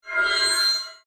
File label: Gear Movement Alien, Metal, Squeak, Device; Science, Fiction; Robot; BlastWave